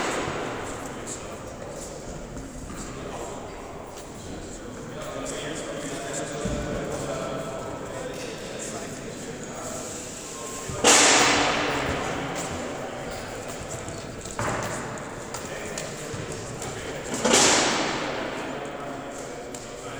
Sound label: foley